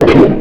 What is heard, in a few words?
attack; slender; steve